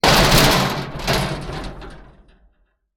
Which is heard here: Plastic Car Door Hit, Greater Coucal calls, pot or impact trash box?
impact trash box